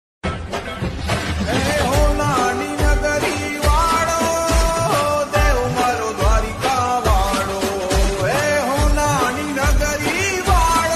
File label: radha krishna ringtone gujarati song ringtone; dhwarakadhish